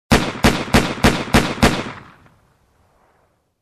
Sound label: Multiple Gun Shots